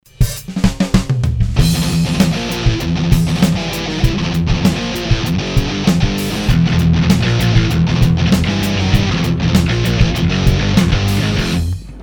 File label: MIC & DI Vintech 273